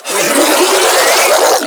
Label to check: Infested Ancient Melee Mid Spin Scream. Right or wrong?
Right